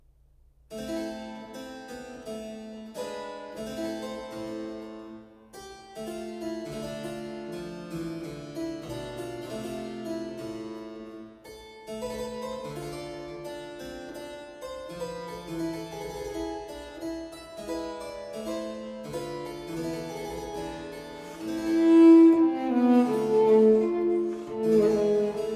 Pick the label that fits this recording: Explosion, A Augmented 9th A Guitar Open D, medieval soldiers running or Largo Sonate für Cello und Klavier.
Largo Sonate für Cello und Klavier